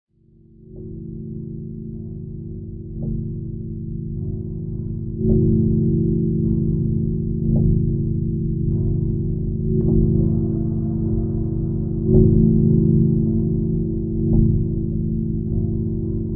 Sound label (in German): adlib weich